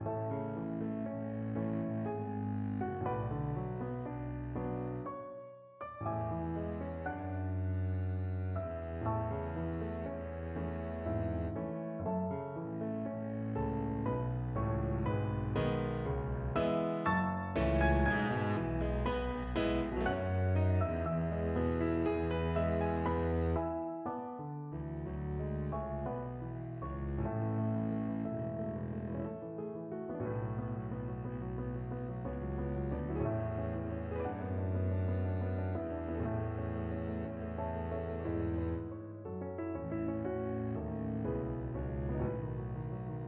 puccini donna, double bass

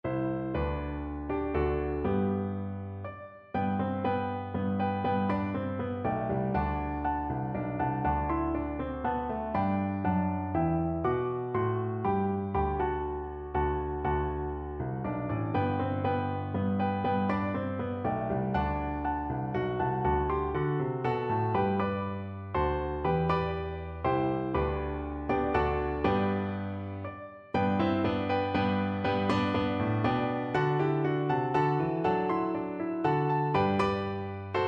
cockles, piano duet

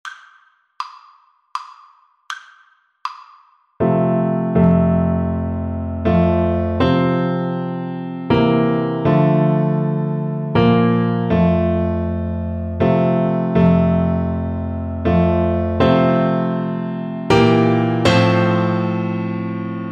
amazing grace kar, trombone

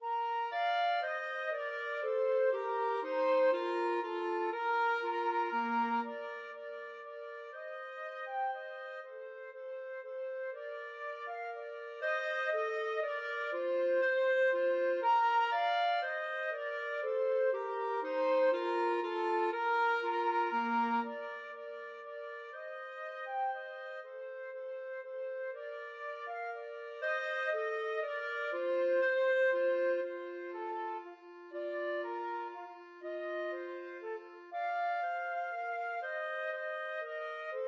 Menuett flute clarinet duet

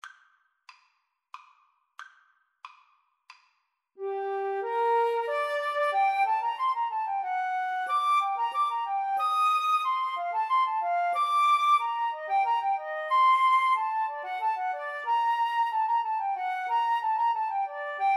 rondeau, Flute-Clarinet Duet

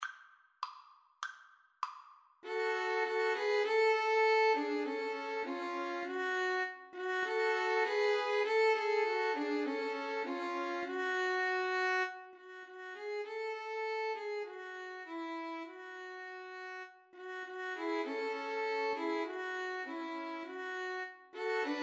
Violin Trio

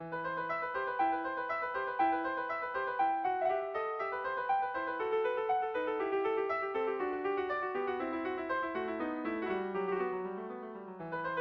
Le Coucou, piano

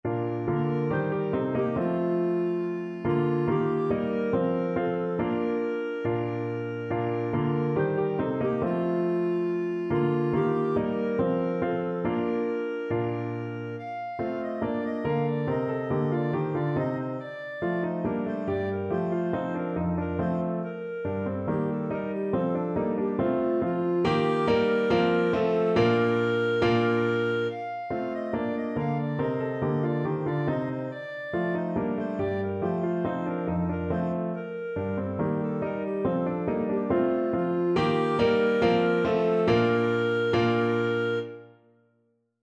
ding dong, clarinet

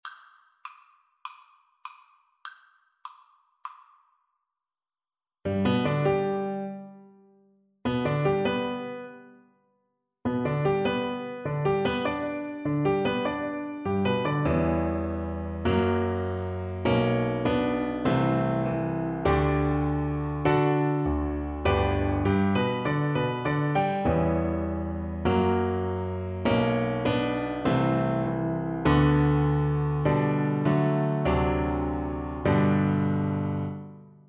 wedding march, Cello; mendelssohn

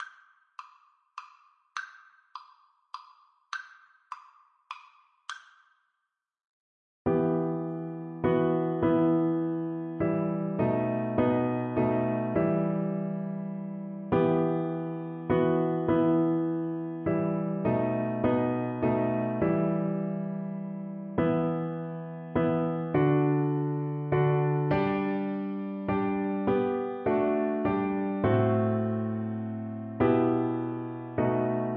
we three kings violin

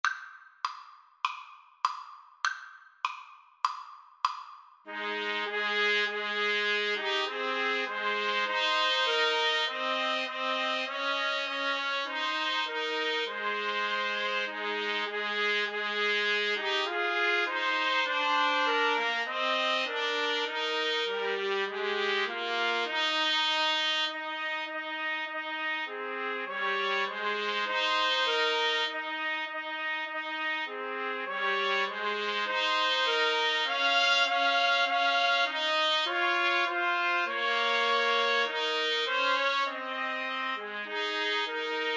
Trumpet Trio

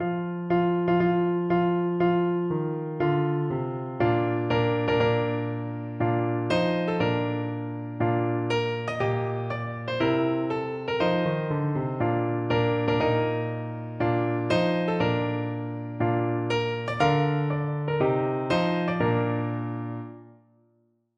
piano

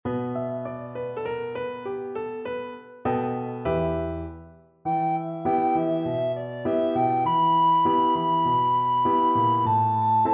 recorder

